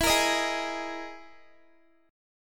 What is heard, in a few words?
E Major 7th Flat 5th, Guitar; EM7b5 strummed; x,19,22,20,20,x; JGuitar